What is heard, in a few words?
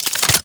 Crossbow Reload Pullback, weapons; Garuda